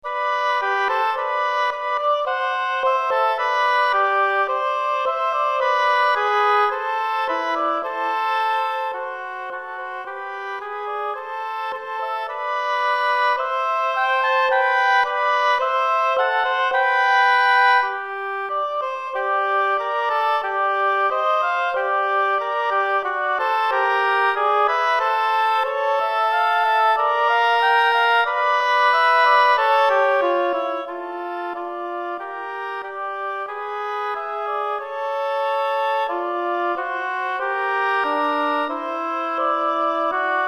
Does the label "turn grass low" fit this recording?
No